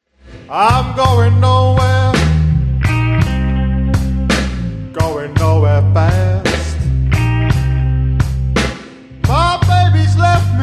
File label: blues guitar